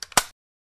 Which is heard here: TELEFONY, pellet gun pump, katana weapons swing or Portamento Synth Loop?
pellet gun pump